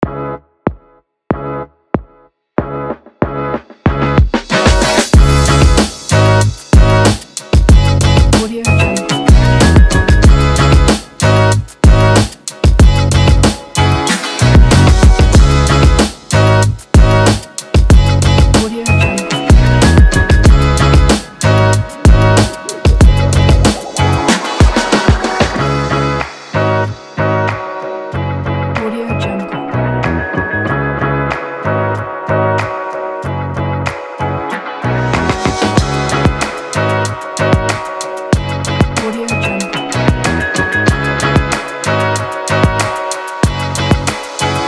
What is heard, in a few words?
hiphop boombap